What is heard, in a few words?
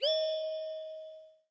accept; awesomewm